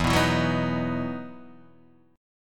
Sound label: E Major 7th Suspended 2nd, Guitar, Half Step Down